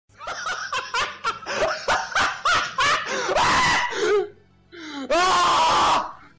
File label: hahahaahahahahahah